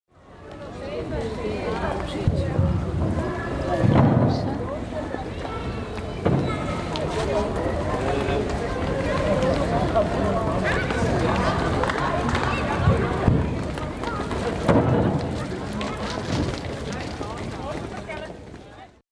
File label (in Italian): campo San Pantalon; suoni